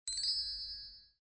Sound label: hover